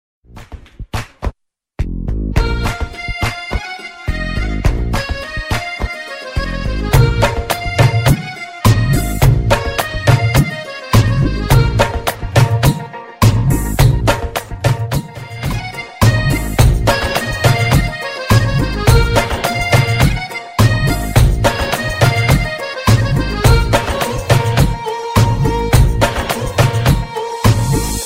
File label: Instr